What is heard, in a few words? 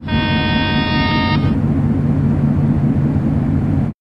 train